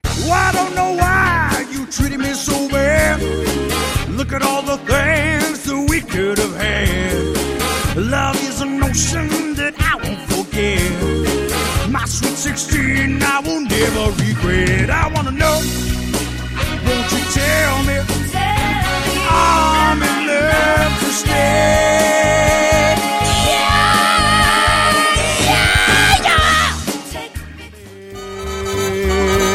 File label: Singing